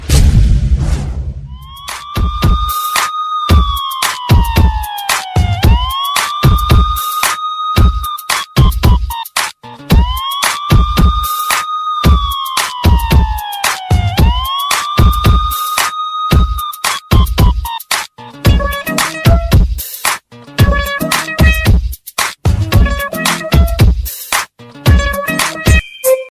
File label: new english police ringtone